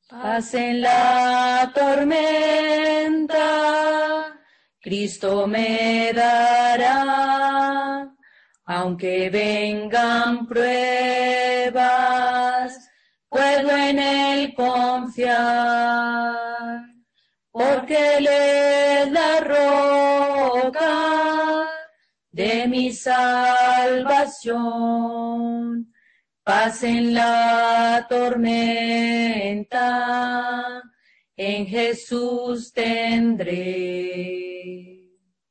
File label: Peace In The Storm (Spanish)